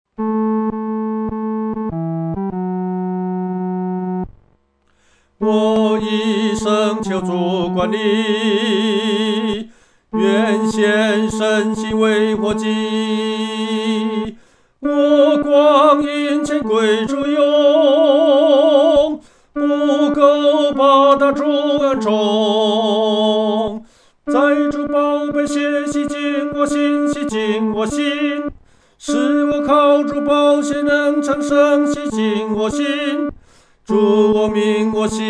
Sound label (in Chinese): 独唱 第三声